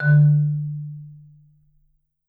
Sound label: Marimba D2